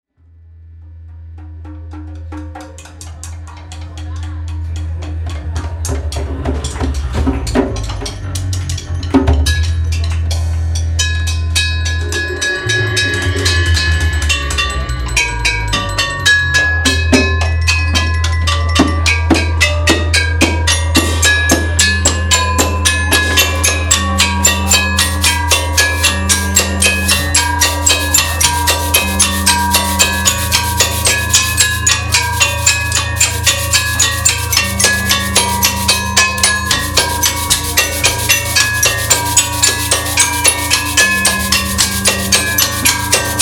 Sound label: Gamelan; Improv